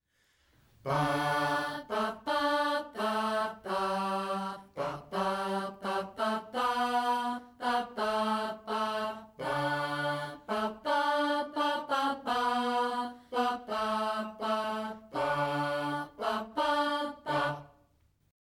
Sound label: Oh Happy Birthday